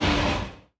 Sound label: distance arc emitter fire, weapons; Stellaris